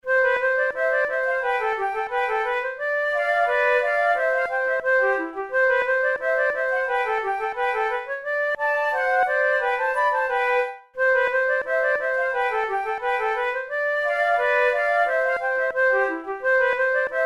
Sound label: pour la flute duos allegro; devienne; nouvelle methode